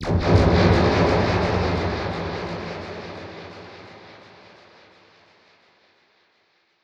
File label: Perc Hit, dub percussion; musicradar